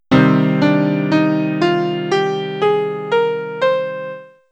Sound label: C minor scale, piano; scales